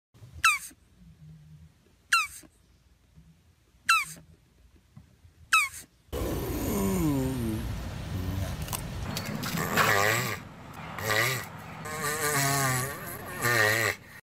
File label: Turtle Sound Effect